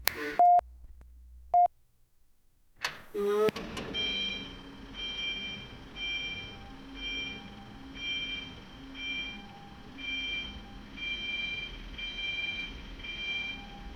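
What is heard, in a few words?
electric forklift reversing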